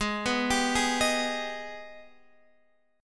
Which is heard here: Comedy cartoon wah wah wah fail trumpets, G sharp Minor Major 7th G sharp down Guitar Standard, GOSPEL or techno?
G sharp Minor Major 7th G sharp down Guitar Standard